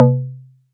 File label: Perc C3